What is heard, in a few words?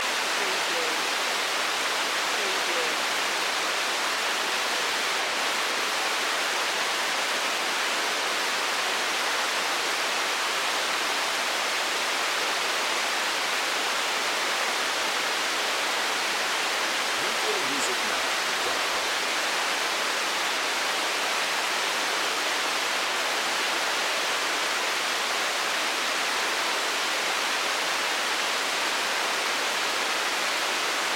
Rain Ambience Loop